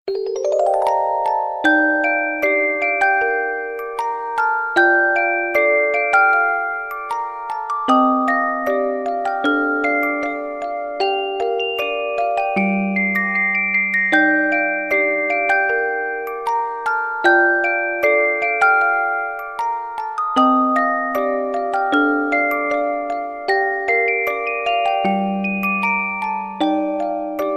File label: Music Box